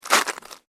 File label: SNOW SCRAPE